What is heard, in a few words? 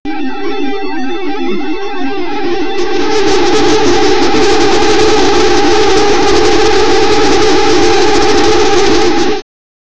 video game 2000s sound ef